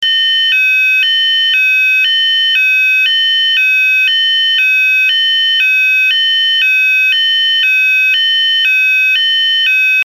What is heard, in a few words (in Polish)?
ton